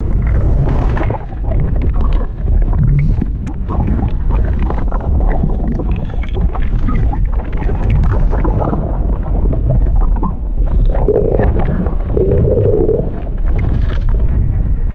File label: thick bubbling and gurgling lava; liquid mud